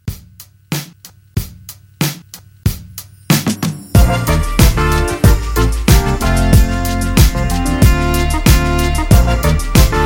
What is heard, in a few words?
Re drum 93